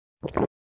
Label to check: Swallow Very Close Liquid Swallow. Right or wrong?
Right